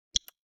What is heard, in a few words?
Lock; Samsung Galaxy S Hoppin